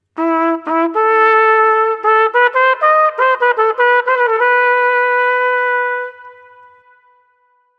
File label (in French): impro trompette; loto sonore